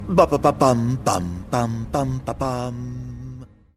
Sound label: fanfare; ffxv; ardyn; prompto; dlc